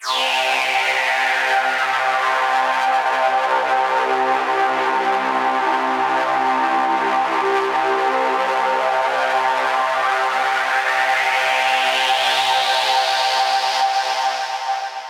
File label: Tonal FX C